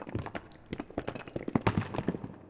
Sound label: rocks; The Elder Scrolls III - Morrowind